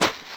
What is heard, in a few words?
Land Snow